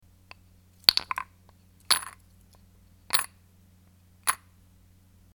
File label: coins dropped in wooden moneybox